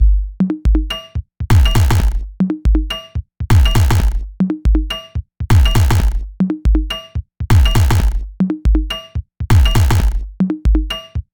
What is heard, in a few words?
Electronic Drum loop wet